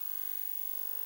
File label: tech loop server